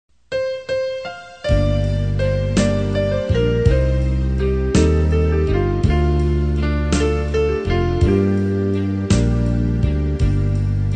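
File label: soprano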